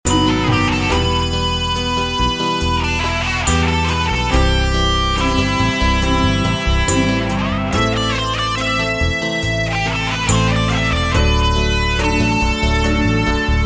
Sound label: solo